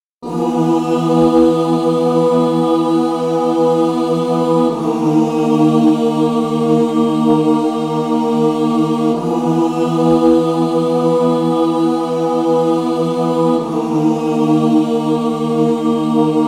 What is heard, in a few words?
original instrumental; 糠川淳二のすご～く怖い話